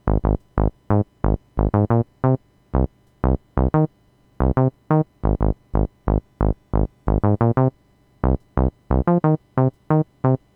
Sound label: bass dry